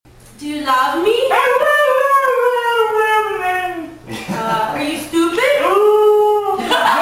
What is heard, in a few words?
are you stupid noooooooooo husky dog talking; mishka